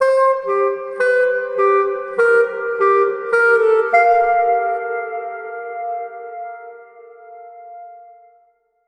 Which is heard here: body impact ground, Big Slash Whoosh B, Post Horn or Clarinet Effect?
Clarinet Effect